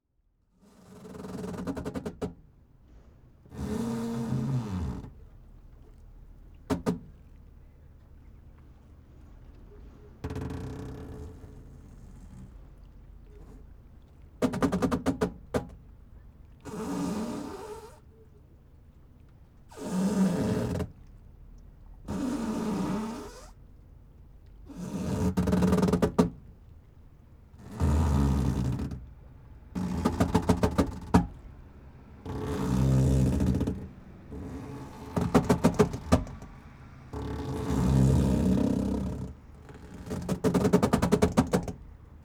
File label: Dock Ambience, Boat, Berth, Creak, Port; Dock Ambiences and SFX; West Wolf